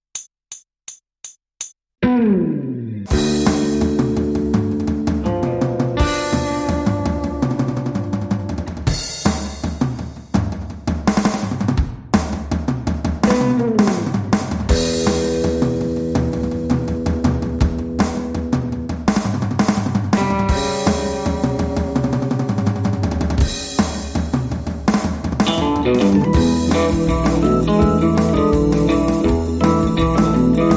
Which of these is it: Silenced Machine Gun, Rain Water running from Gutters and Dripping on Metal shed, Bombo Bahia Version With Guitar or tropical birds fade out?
Bombo Bahia Version With Guitar